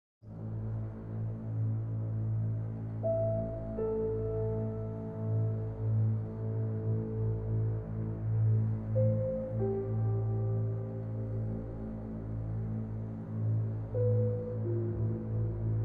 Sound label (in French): speech